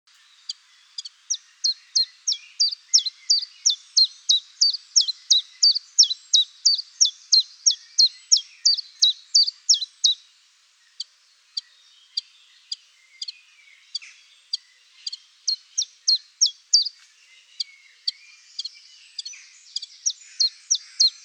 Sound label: Chiffchaff